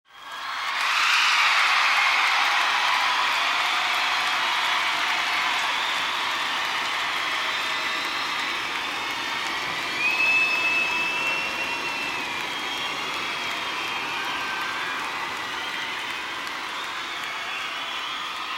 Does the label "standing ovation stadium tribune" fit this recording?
Yes